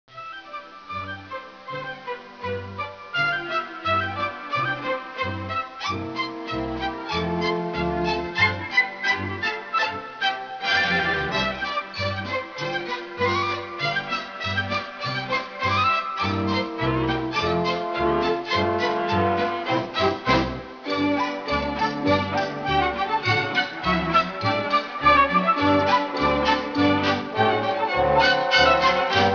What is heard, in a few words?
classical